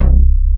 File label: DARK BS C2; Q Up Arts; Dream Experience